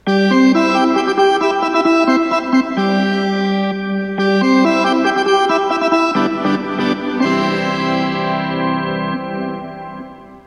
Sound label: Organ Start Race